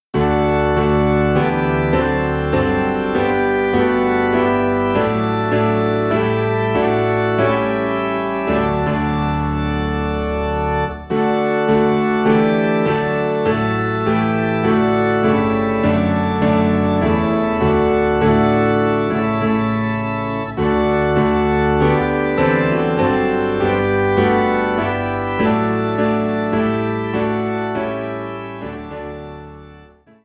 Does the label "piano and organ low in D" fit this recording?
Yes